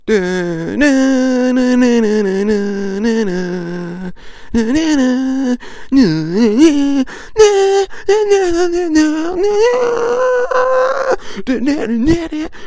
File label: 80s love song